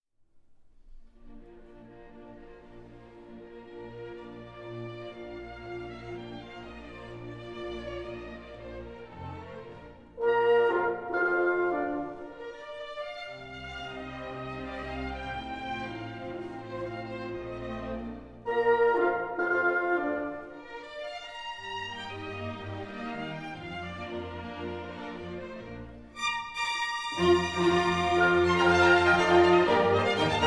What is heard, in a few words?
Piano Concerto No 27 Allegro; Mozart